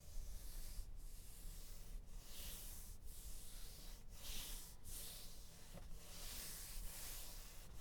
Rubbing Leather Steering Wheel Bmw 118i

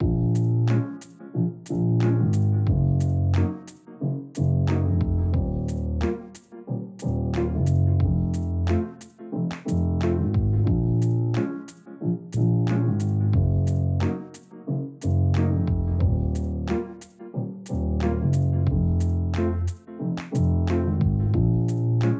Piano Groove

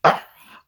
Gnasher Hurt

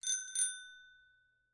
Bicicle Ring

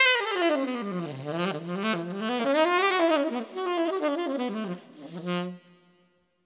G Maj 90

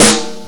Snare Lo Fi Distorted

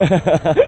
Merry Male Laughter

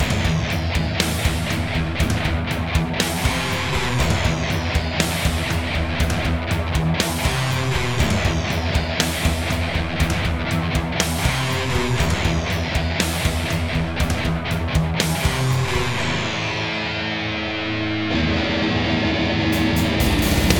Death Metal Intro

